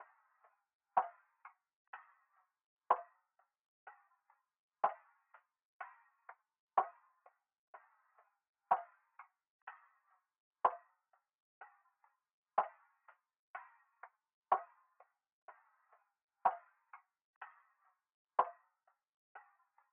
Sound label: 124 BPM A Min Perc, loop stems; Cymatics; 2022 Melody Collection; One Over